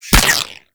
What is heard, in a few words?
Ghost Expel Spirit Impact